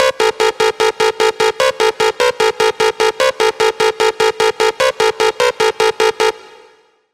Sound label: Lead; Hit The Brake; Routed Sound; Serum 2 Expansion - Hyper Gamer Vol.1